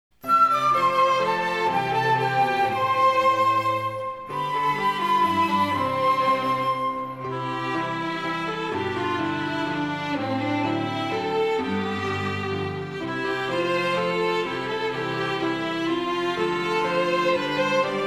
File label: instrument